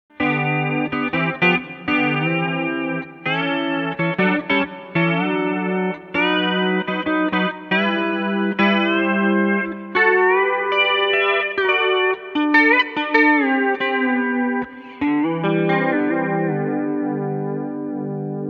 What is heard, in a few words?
Flanger, AXE FX 3